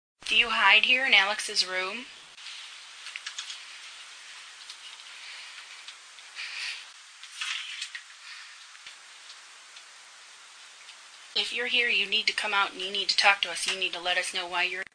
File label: Fire